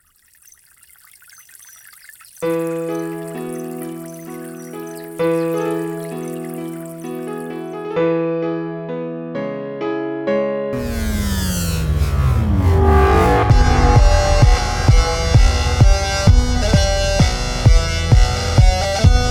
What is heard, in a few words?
Morning Rock